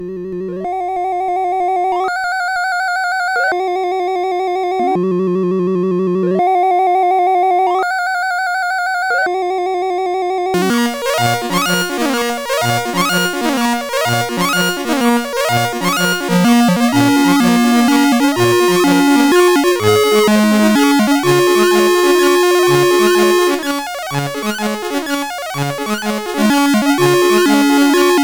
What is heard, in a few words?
sid CSG8580R5, GAMES; Black Hawk